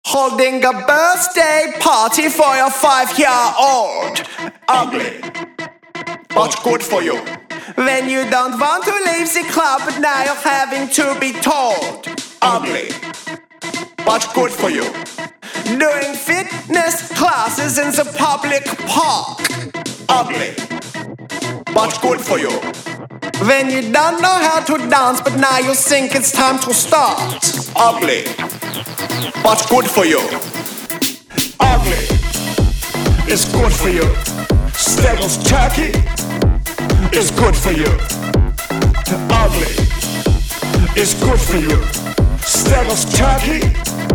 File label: German Jog Techno